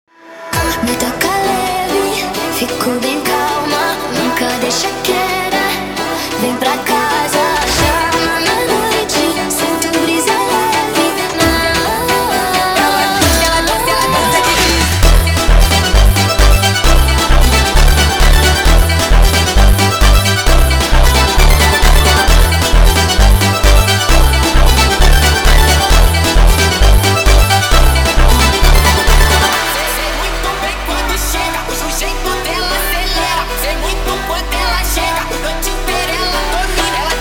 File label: Phonk, Sped Up